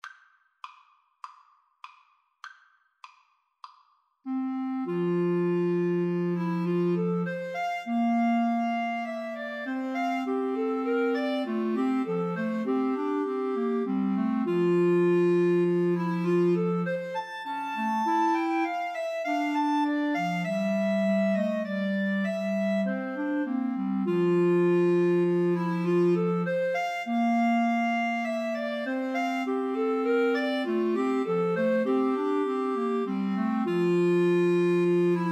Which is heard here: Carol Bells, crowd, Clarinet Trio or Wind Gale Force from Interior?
Clarinet Trio